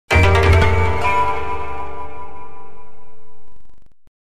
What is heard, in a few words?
Game Over, neo geo; sengoku 3; Ruin Oneself